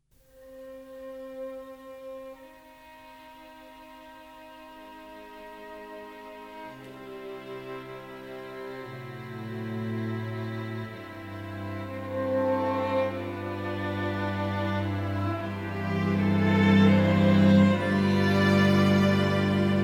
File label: quartet